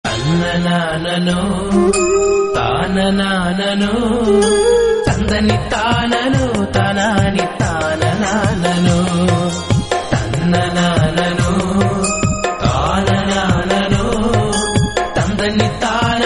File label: malayalam devotional, ringtone